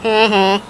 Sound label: "HEH-HEH"; gemiti esclamazioni mugolii